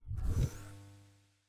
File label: Teleport Transport